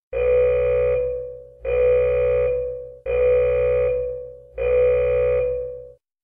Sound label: warning alarm sound